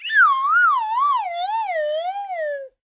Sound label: cartoon dizzy